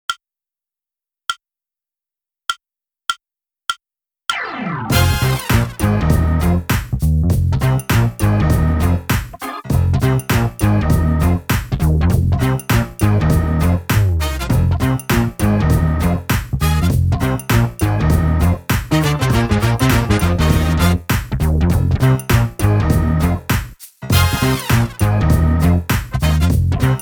Gospel Grooving Funk drumless

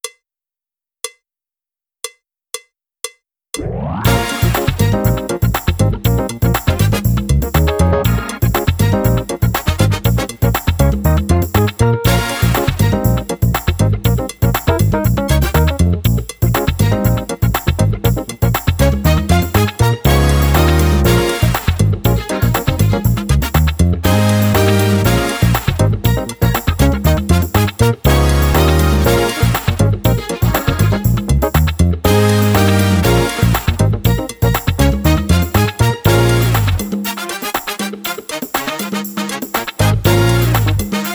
Funky drumless

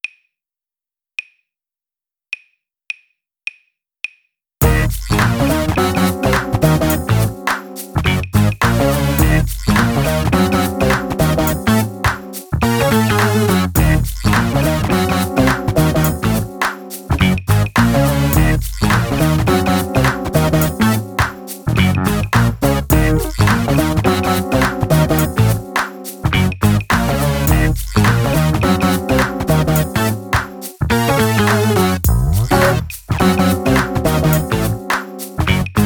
Spooky Fusion drumless

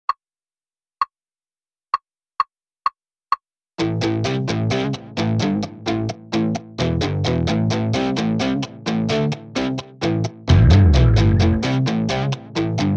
Rock drumless

